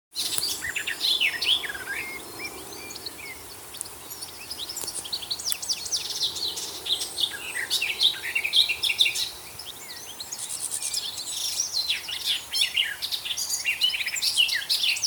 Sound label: Garden Warbler